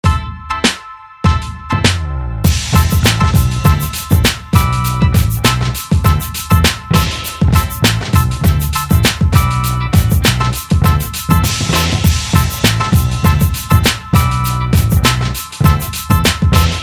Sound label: Turkish Funk